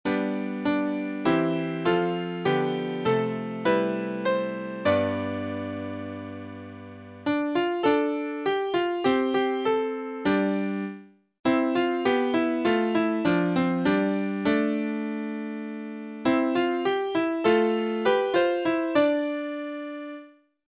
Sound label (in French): phrygien; mode phrygien; Concert "Barzaz Breiz, la mémoire d'un peuple"